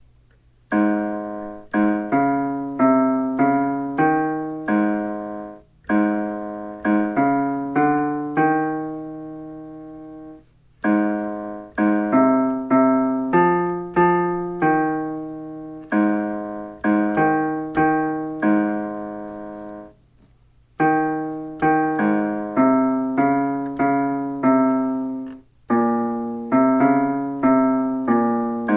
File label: Bass